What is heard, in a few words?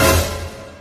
suspense strike